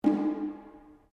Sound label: DRUM TAIKO SHIME ROPE MF, Small Japanese Taiko drum; Miscellaneous Musical; Sound Effects:Musical